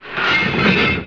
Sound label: Game, 游戏音效; 特酷声音素材库